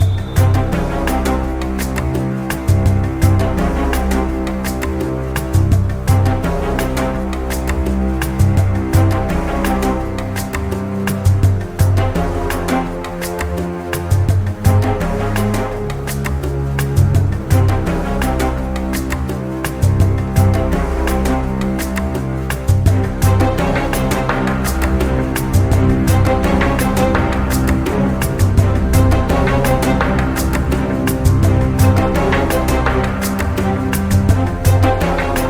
Under Surveillance

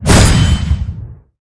time distroy

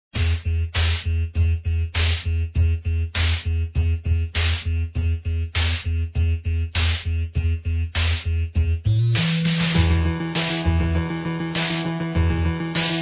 bgm

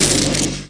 Thunder

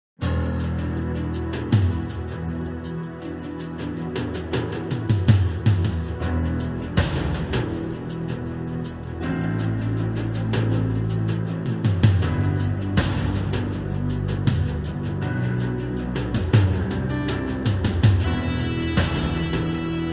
Battle

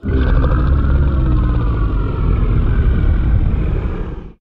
Growl

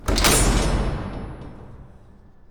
door

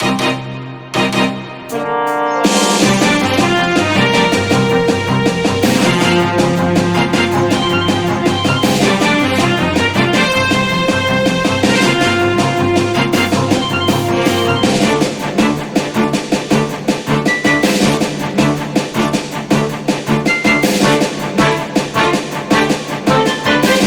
Bgm Fantasy

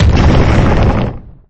fall; LiveOrDie

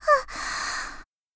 Voice Breathing

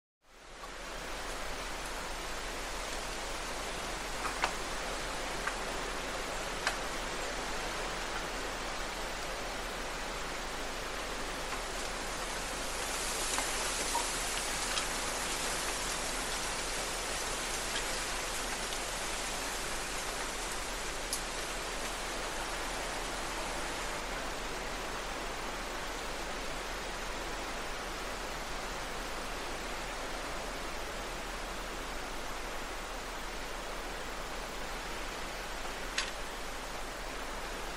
Wind

